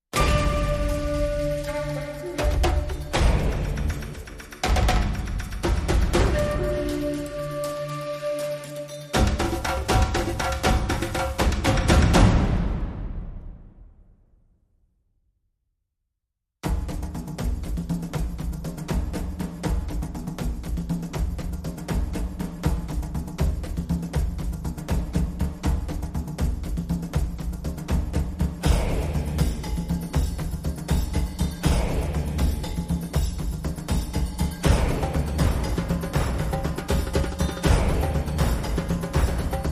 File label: No Didgeridoo